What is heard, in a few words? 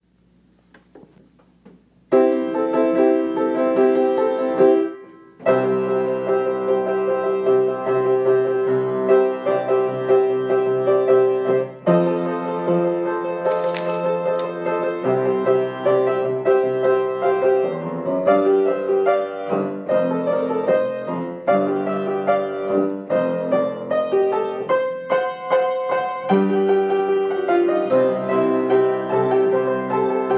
accomp